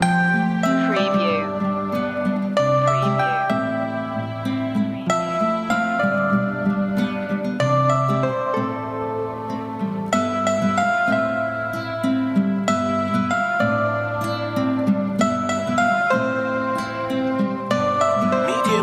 dreamy bells

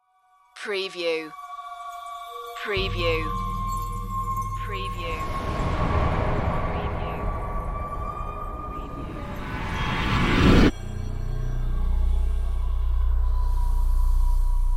dark ambience

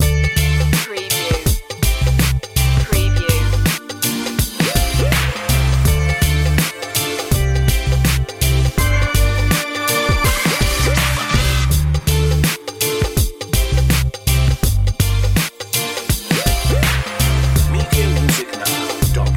bollywood seduction

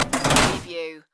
Cls Broilr

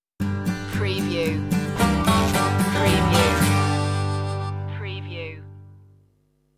farmyard blues stinger

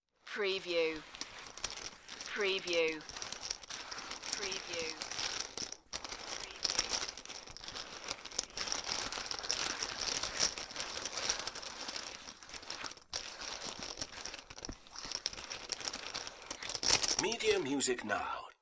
Poker Chips Moving on Felt

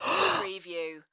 Gasp Male

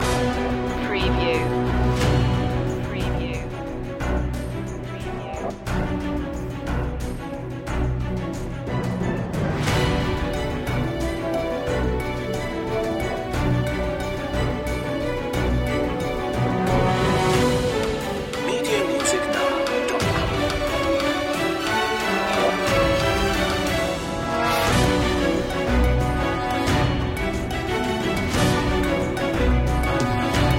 no choir

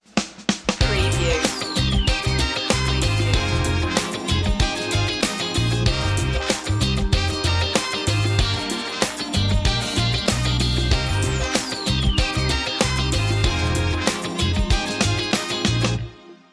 forever funk

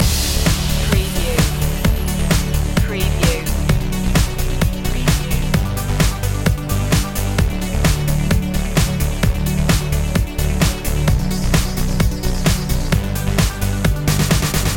glider loop

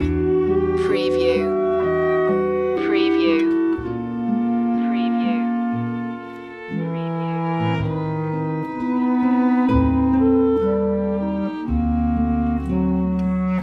winter melancholy loop

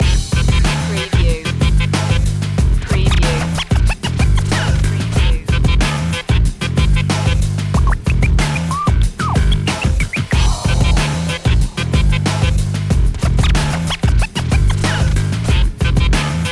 no pain no gain loop

